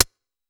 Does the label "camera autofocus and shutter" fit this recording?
No